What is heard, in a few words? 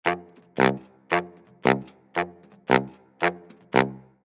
Baritone Sax, Baritone Saxophone Deep Stabs Funny Walk Loop; Cartoon; Musical